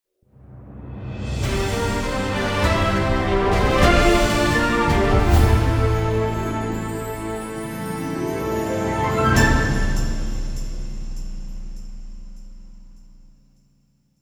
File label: Item Acquisition Fanfare; Stephan Wells